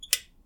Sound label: old light switch